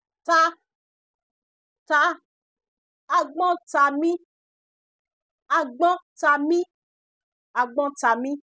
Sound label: Sting